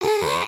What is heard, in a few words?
fart